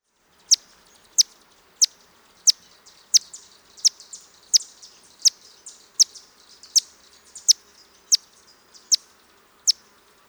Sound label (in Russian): kolibri